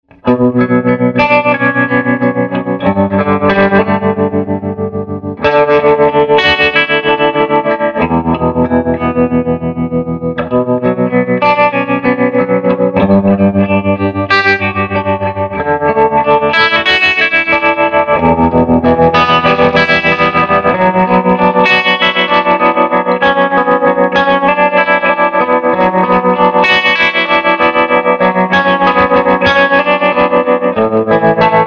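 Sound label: SG tremolo dirt clean, Gibson Historic SG; SG Tremolo Dirty Clean v2; Swart AST Pro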